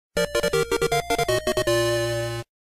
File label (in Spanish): Pokémon capturado Pinball